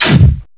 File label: fire laser Auto